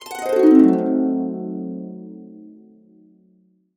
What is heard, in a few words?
C DIM7, Harps